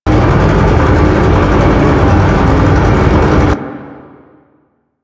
Shipyards Cooling Machine Loop; Ambience; Props; Warframe; Grineer Shipyards

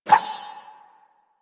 Rapier Whoosh; Swords

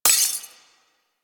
Sword Enemy Frozen; Weapons; Melee; Warframe; Orokin Weave Sword; Weave Boss

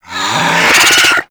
Infested Charger Run Breath, Enemies; Warframe

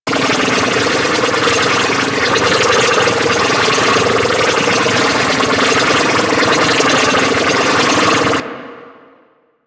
Heat Gun Loop; Grineer

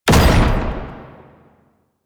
Perfect Heavy Attack Impact; Warframe; Weapons; Melee

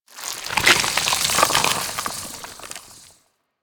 Infested Bone Blade Movement Foley; Warframe

